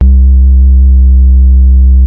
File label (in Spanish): onda triangular